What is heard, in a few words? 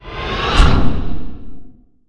haste